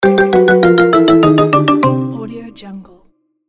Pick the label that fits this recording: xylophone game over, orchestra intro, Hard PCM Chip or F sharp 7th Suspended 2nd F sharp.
xylophone game over